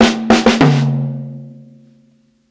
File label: 60'S STYLE 100 MTOWN FILL, DRUMS LOOPS; BASEMENT DRUMS VOL 2